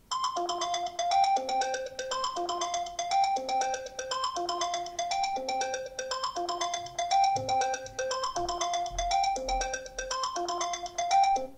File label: apple iphone 5 circuit ringtone